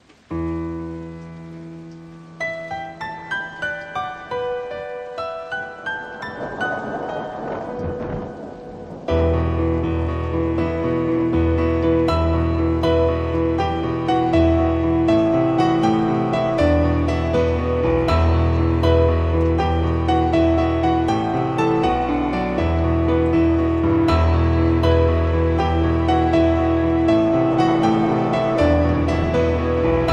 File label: Piano; Kirby